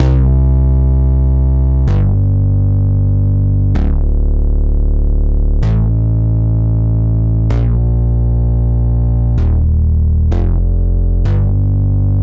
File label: Poly Bass